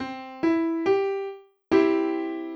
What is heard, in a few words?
C chord